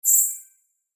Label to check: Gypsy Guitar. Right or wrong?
Wrong